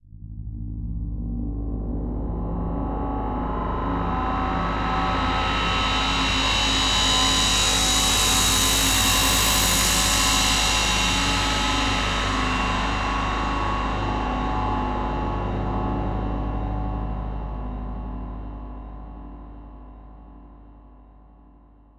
Metallic Whoosh

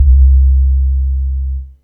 Juno Sub

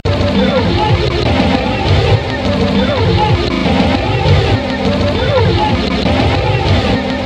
Beach Abstract Music Beat Loop

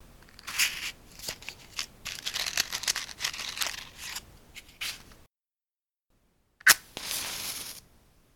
Match

